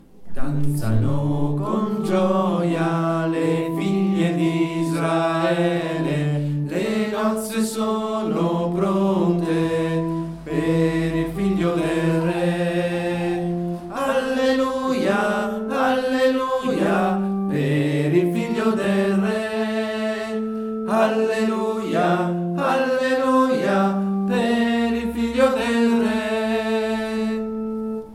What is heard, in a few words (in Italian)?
tenori